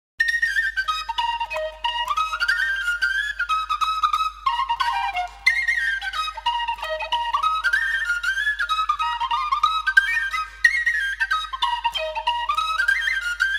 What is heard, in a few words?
belfast hornpipe